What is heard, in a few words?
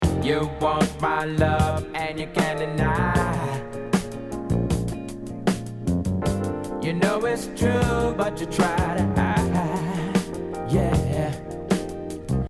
Clean